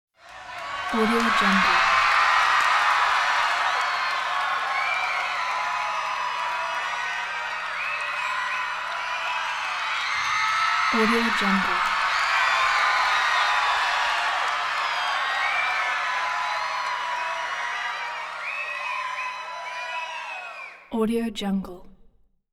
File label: large crowd cheering; hollywoodedge